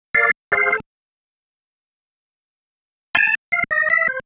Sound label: Single Riff, Organ